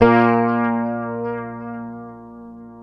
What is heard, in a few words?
fingered bass A#2; Sampleheads; Will Lee Bass Library